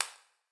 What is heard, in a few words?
spank; spellbound